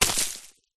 stones granite gravel and dust kick foot disperse roll; foley; zapsplat